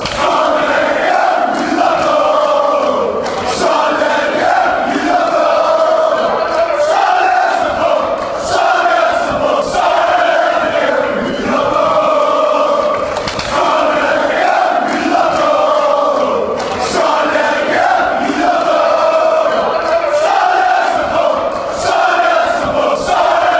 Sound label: chant